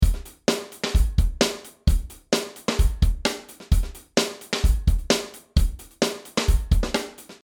Terapop 130

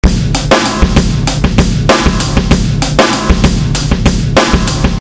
Drums Limiter

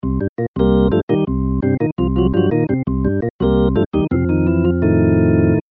Hammond B3

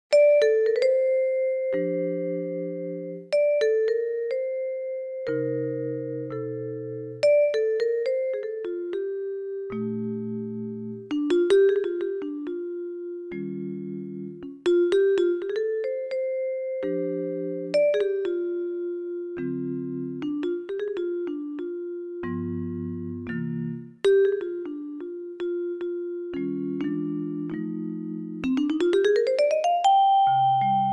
Jazz in Spain Vibes

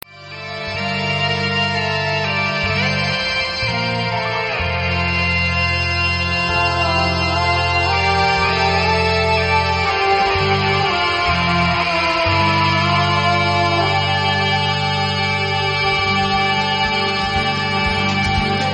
storm

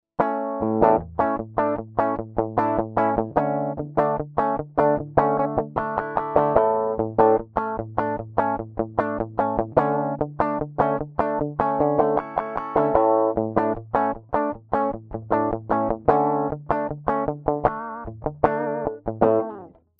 American hi-gain rhythm

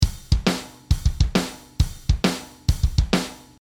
Heavy Metal 135

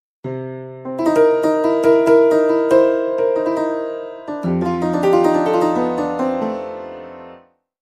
Honkey Tonk Piano

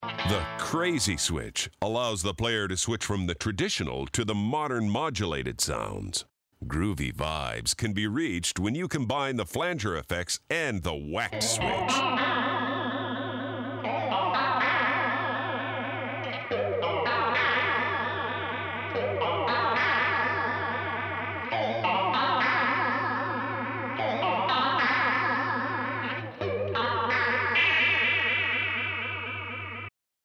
Groovy Vibe

